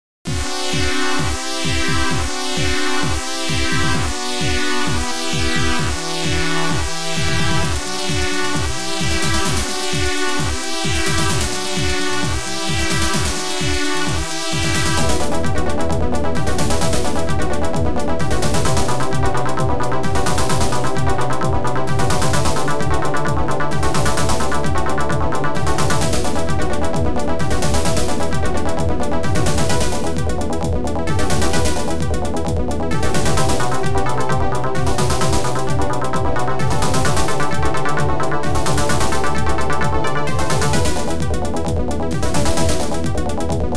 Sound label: OPL2; YAMAHA OPL2; Tyrian